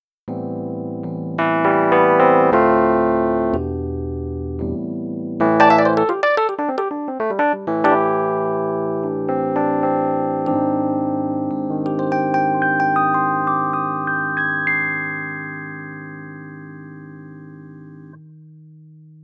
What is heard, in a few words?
Yamaha Rhodes